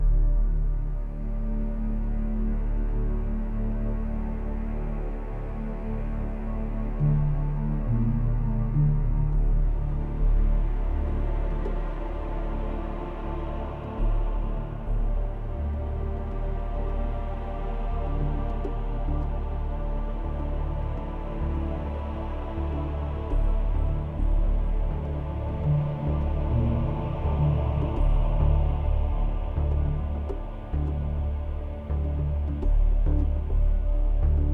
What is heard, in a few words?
Dialogue Neutral; The Dark Knight Rises; Android, iOS